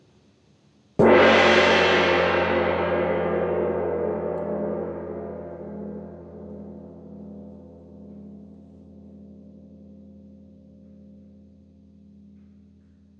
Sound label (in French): petit 1coup milieu bas, GONG VIBRATION